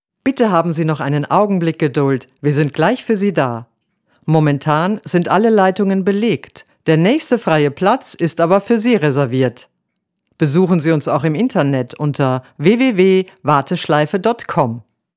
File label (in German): WARTESCHLEIFE